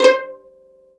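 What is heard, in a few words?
E-Mu Proteus FX Pizzicato Violin C5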